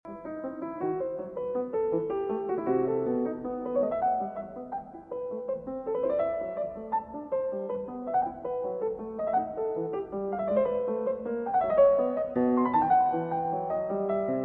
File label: Partita No. 6 in E Minor, Courante; BWV 830 III.